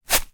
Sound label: foley footstep grass single